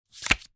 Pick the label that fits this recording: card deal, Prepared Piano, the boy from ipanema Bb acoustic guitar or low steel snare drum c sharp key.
card deal